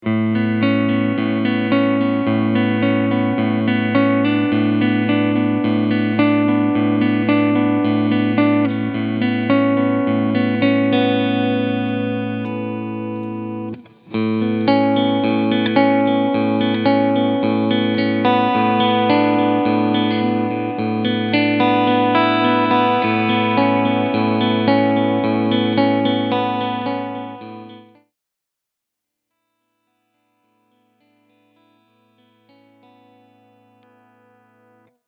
PRS NF 53 Alle Kombinationen Cleansound; Blue Matteo

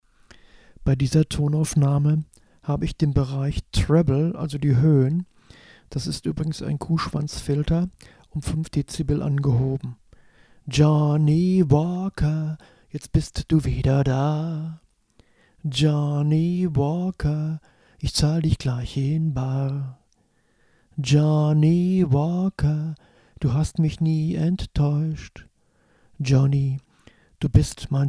Akustik Duo; selbst abmischen

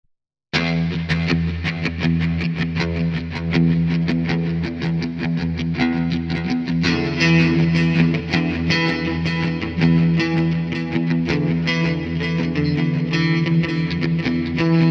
DI Aufnahme EQ vor Plug in

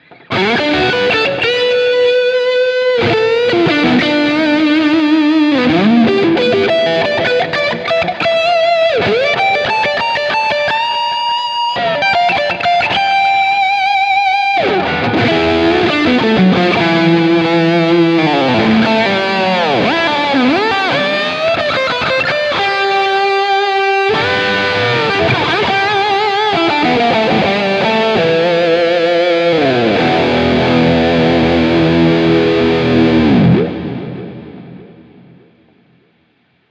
Gitarren Plug ins im Vergleich Highgain Lead; S-Gear

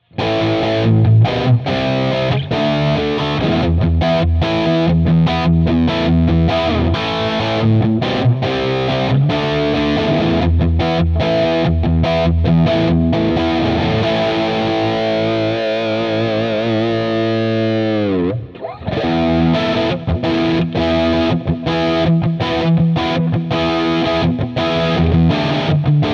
Gitarren Plug ins im Vergleich Logic Amp Designer Highgain Rhythm

